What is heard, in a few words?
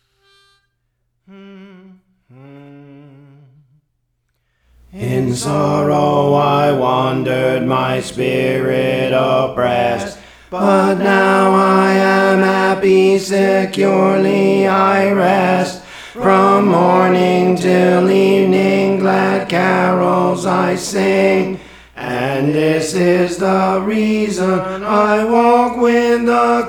Alto; Praise For The Lord Training